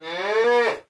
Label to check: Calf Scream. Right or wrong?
Right